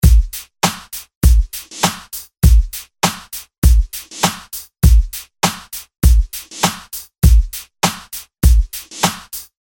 Drum Loop FX Comp

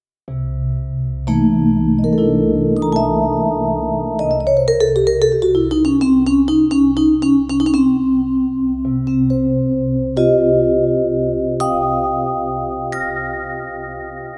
Yamaha vibra

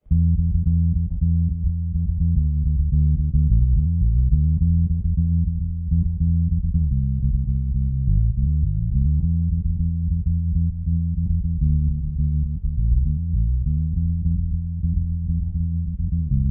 vamp D6

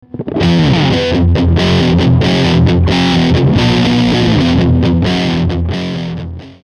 metal riff

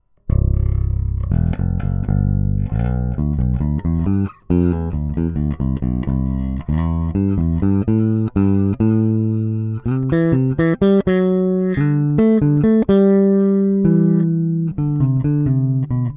Both Pickups Tone open

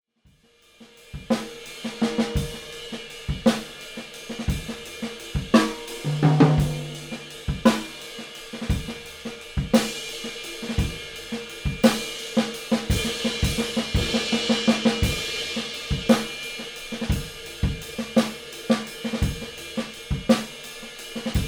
Drums Mono Room; Extinct Audio BM9

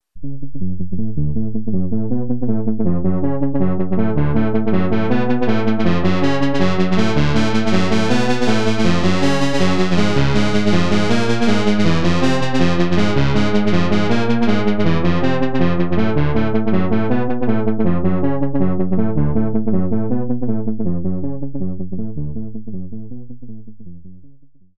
Phase Distortion

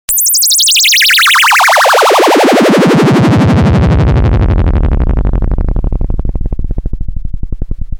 PPG Filter Drive Full; PPGred

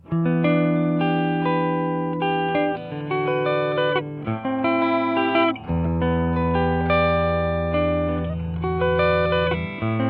clean neck humbucker

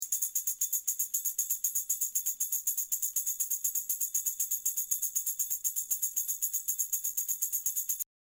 Duet Tamb

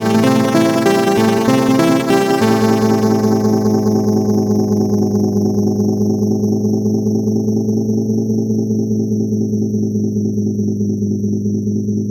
Bastl Thyme Freeze

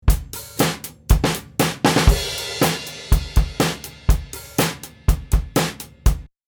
Zoom F8n Drums Slate Mic

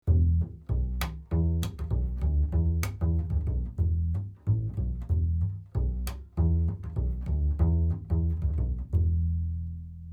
Upright Bass 40cm 80Hz HPF; Aston Origin

